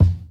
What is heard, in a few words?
Kick